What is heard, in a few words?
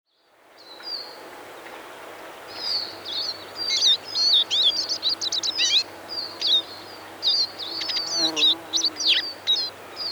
Siskin